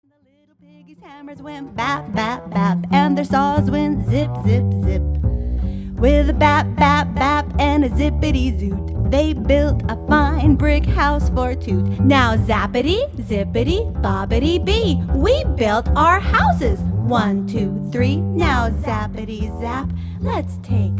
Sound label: Three Jazzy Pigs